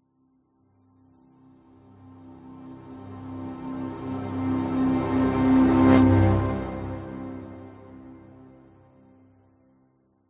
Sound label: sound design cinematic orchestral music riser dramatic horns strings; zapsplat